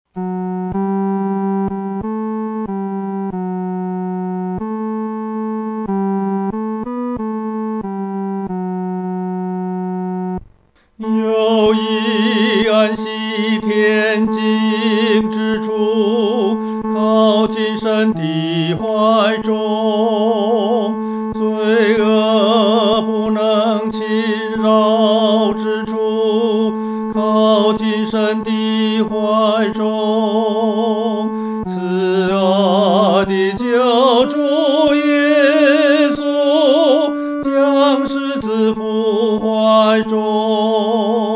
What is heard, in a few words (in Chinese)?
独唱 第三声; 靠近神的怀中